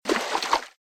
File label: Footsteps Water Ankle Level; RadwinIsland